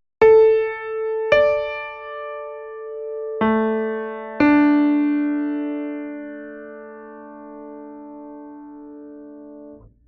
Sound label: 440 quart